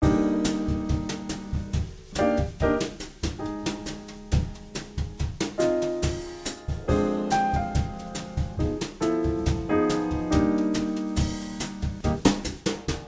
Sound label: no bass, playbacks